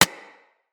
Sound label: Bullet Impact Generic